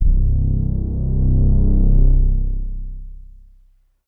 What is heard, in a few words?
Ensoniq SD-1 Bass Pad C3